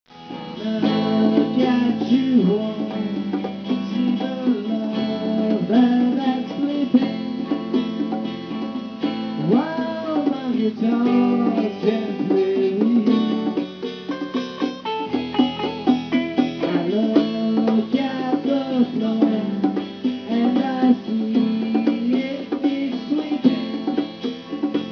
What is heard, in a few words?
guitar